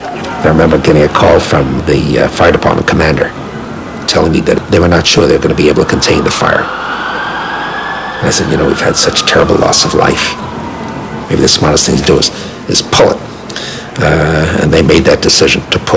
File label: pull; quake2